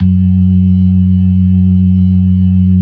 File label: LO MANUAL VIB, Organ; InVision Interactive; Keith Emerson; The Most Dangerous Synth and Organ